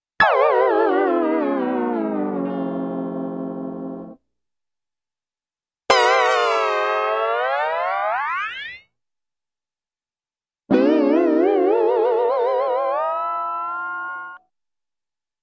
Ascending & Descending Elongated Hawaiian Guitar String; Hollywood Edge Cartoon Trax